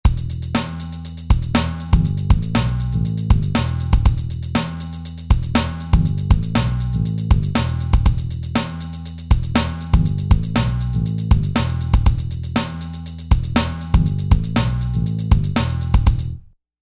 Funk

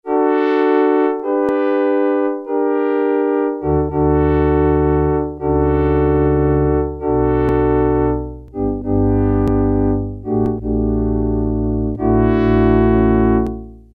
French Horn, Yamaha TX81Z

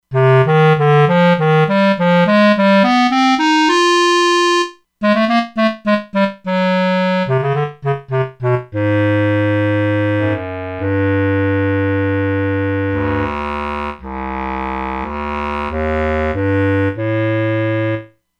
Bass Clarinet, E-mu Proteus-2; Factory Presets

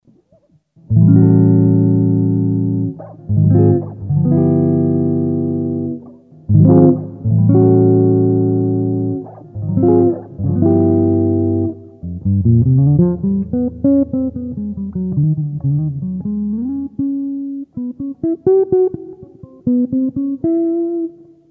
JAZZY CLEAN; Digitech RP200; Factory Presets

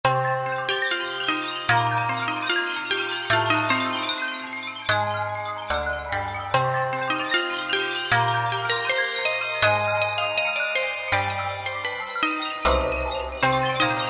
syn Asian Dreams, E-mu PK-6

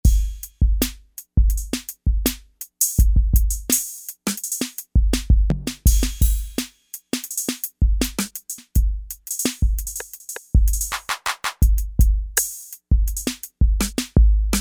Analog Kit, synth

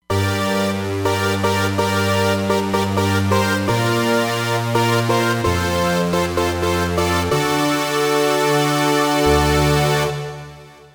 Super Saw Slow, Roland Fantom XR

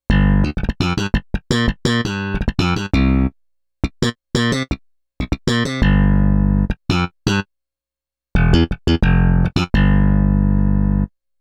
Slap Bass

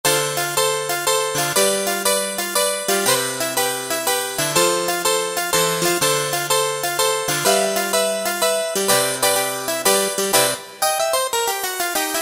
Harpsichord, Kurzweil K2000; Kurzweil K2000 Value Pack; Extra Programs

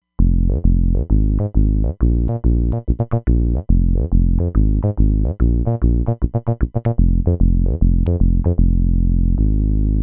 Punch MG; Roland Fantom XR

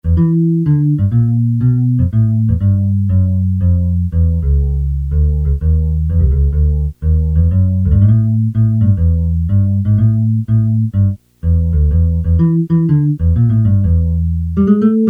Multi Split Bass, Casio CT-370; Tones

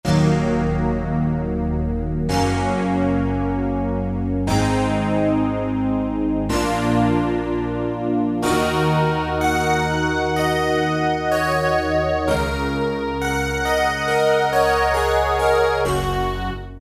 Pad Combo; Roland PN-D50-04

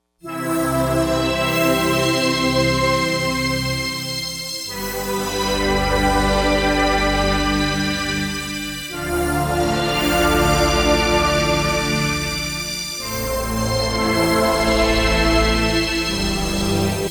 Chime Mix; Digital Synthesizer

